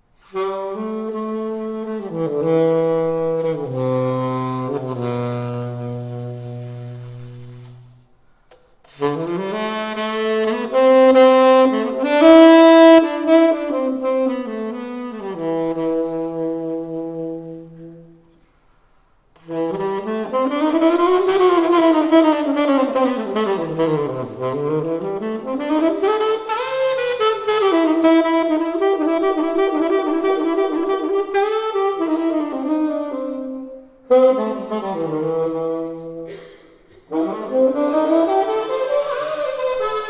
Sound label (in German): Improvisation - Tenorsaxofon